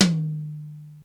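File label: tom high; xr10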